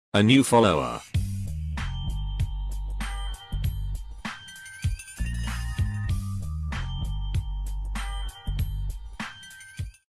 follow sound alert sound and donation sound for twitch